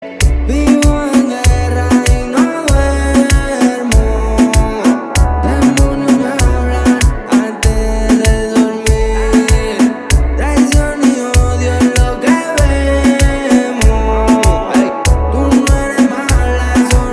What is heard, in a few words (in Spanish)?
7 trompetas